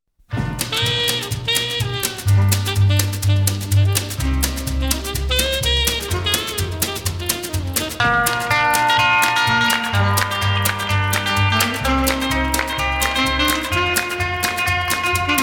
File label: whispering bells